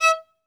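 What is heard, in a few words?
solo violin, strings; ILIO; Synclavier Strings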